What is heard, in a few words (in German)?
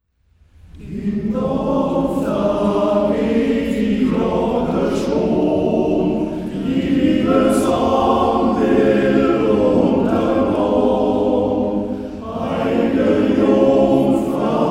Im Dorf da geht die Glocke